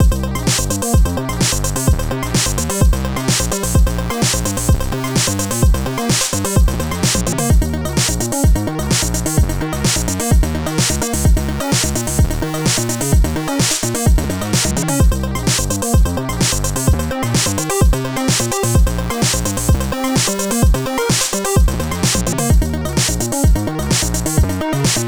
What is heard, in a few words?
FUNKY C64 BASS; VANAGON